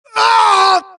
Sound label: death, Axis; mohaa; Mission 1